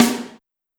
Snare, Drum Machines, Clavia DDrum AT, Pitched Up

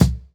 Kick, jungle breaks drum sounds

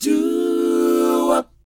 DOWOP G; ILIO; Vocal Planet

